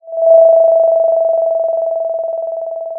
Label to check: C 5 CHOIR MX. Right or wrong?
Wrong